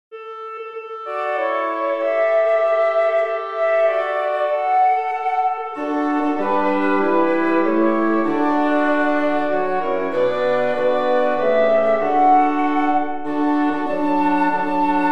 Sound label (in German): blaeserquintett